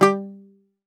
Greek Bazouki, Plucked Strings

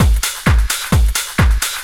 groove, DRUMLOOPS

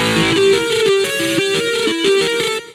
Egypto Rock, Keyboards

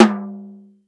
RING, JAZZ; Hollywood Edge; The Big Bad Beatmachine

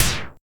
Snare, retro house samples, Drum Hits

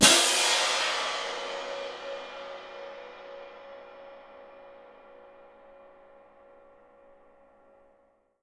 CYM MF, Percussion; Miroslav Vitous - Percussion & Harp

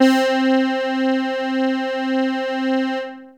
Techno leads, hits and riffs

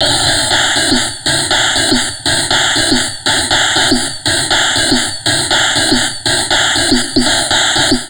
Drum Loops Crazy Processed

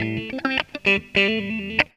GTR, Funky Elements, GTR RIFFS; Zero-G

